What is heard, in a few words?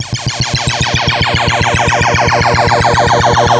Tihihihi